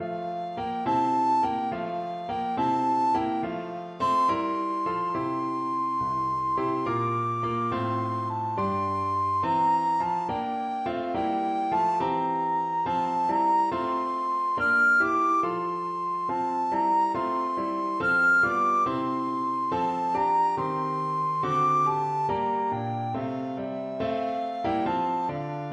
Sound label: wassailing recorder